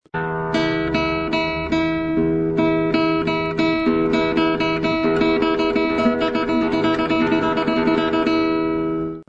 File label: Tremolo